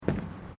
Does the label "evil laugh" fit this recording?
No